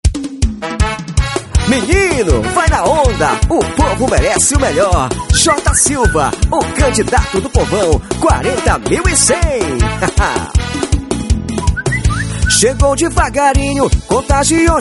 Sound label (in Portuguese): Jingle politico